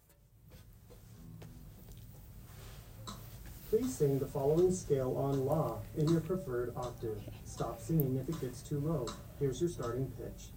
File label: alto; Audition